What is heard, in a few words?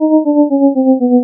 sad trombone